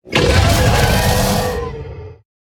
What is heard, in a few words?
Storm Drake Death; en